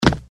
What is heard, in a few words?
land run; disneyxd; stuntastic